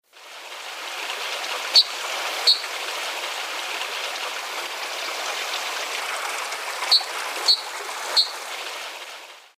Cinclus leucocephalus leuconotus Mirlo acuatico coroniblanco